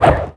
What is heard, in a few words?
Sword Swing; Player; Squire; Dungeon Defender; DunDef